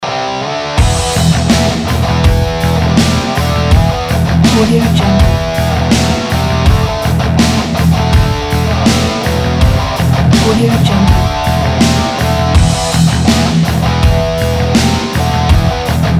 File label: heavy metal